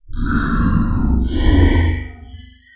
growl

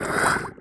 gurp

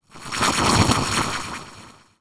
weird

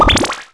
shields down; tuxmath; theme classic

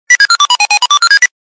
8bit; RingTones

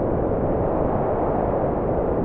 wind

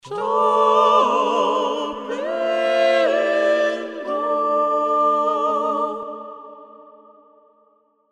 Jingle

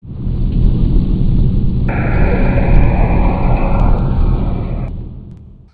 machine

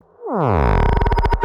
Toc Laser

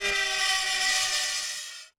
screech

